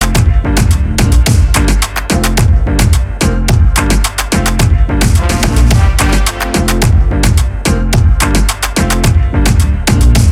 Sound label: Dancehall Dub Remix